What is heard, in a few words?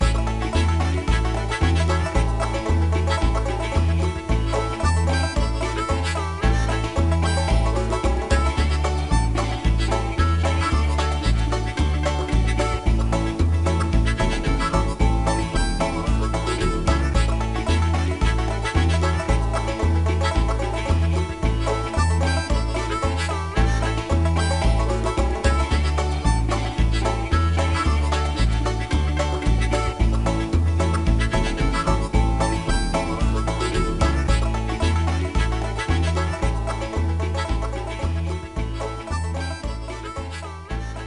Bonus; Extreme Hunting